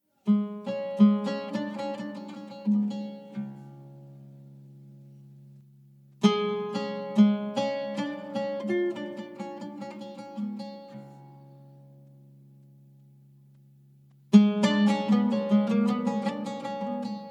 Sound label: Taknavaziye Oud